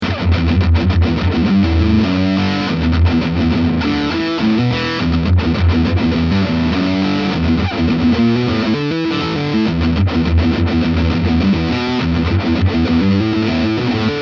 volle zerre steg american

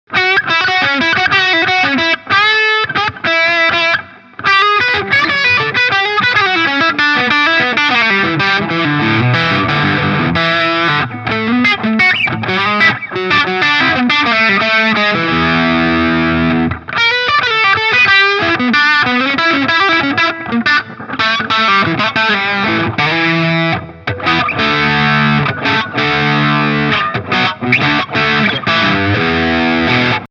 Fuzz moderate Verzerrung

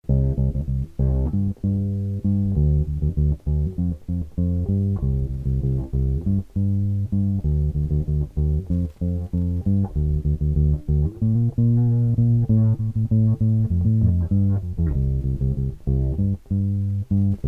AGB200 Daumen beide flat

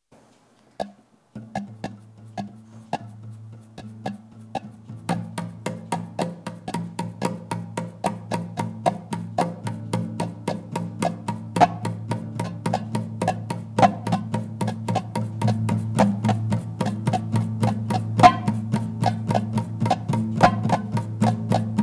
Percussionimpro mit eing. Mikro

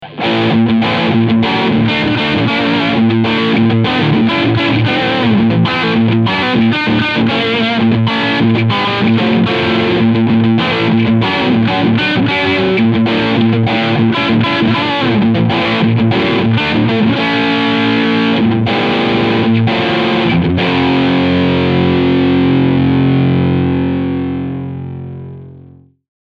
S7 Vintage 3TS Overdrive Riff Steg PU; Larry Carlton

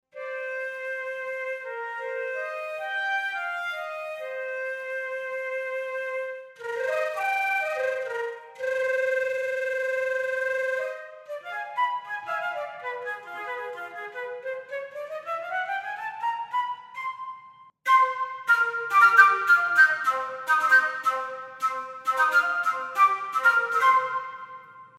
woodwind flute diverse Artikulationen

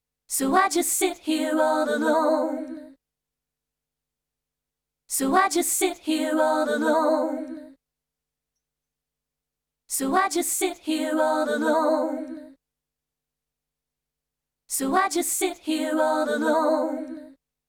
Kompressor 4 1 mit D S

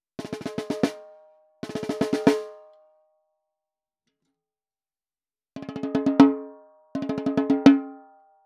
Snare record mit the tbone MB85 Beta

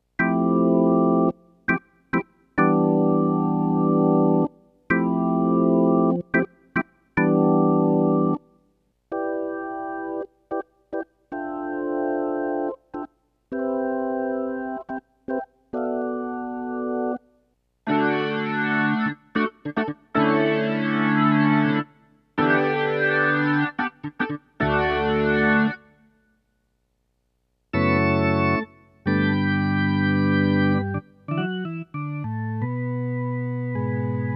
Orgel

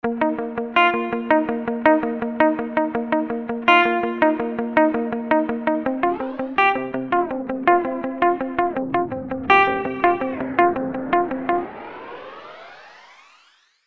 Gitarre Morphing 5 FX

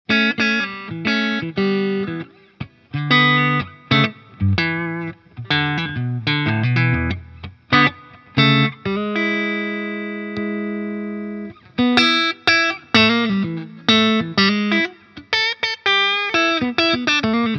Hals PU max. Kompression

